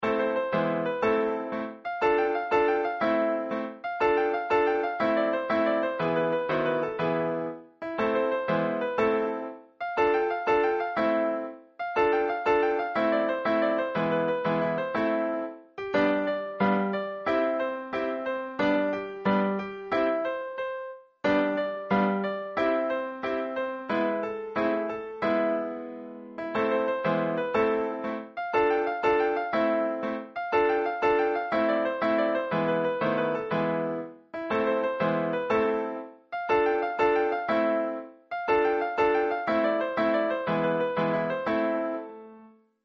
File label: klezmer